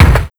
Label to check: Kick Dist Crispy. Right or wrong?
Right